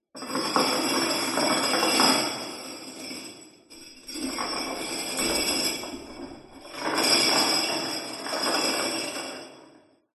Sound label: horror chain large drag ground dungeon reverberant